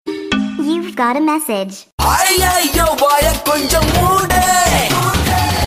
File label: Tamil Tone